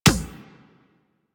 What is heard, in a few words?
Beach Ball Floof Kick